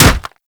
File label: Staff Impact Flesh; Warframe; Weapons; Impacts